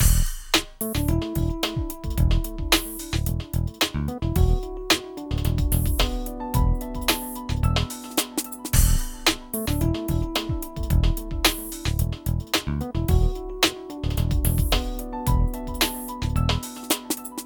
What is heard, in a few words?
Loop Ambient